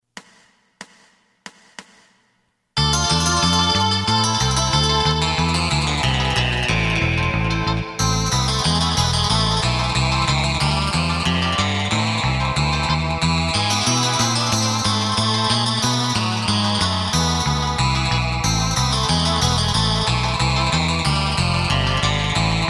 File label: drum